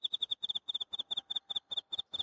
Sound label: Amb Hong Kong Insect Cicada